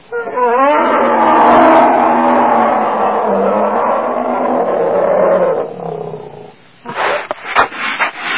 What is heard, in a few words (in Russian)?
molodoj medved richit i firkaet